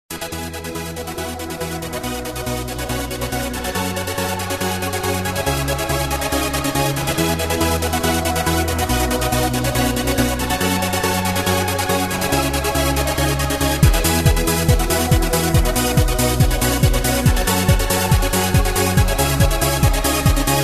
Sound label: ringtone